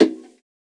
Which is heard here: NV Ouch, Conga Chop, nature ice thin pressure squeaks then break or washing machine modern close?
Conga Chop